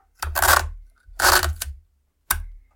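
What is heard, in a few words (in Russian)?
скотч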